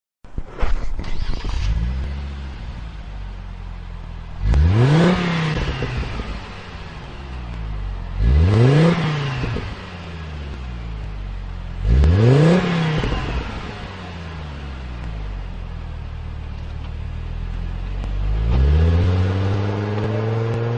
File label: magnaflow exhaust systems